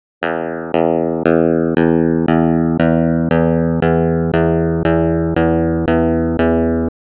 SYNTHETIC Plucking Point 26mm to 326mm, electric guitar; electric guitar synthesis